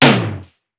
Simmons SDS2000; SDS2000 Factory8

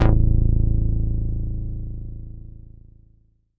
Fatboy Tight a1, Korg Poly-800

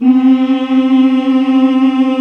MALE HUM C3; AKAI S-Series CD-ROM Sound Library VOL-8

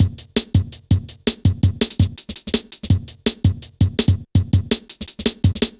Skippy, Drum Loops, Jungle; Zero-G Jungle Warfare 1, 2 & 3; Skippy A 165.5

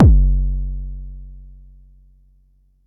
Bassdrum; Jomox Xbase09

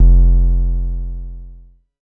DSM2 Sub; MPC DSM-2

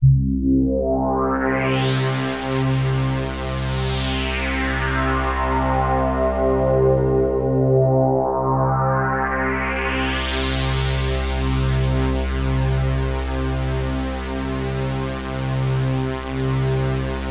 Access Virus B C3; Synthworx

